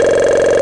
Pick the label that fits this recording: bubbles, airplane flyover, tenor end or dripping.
bubbles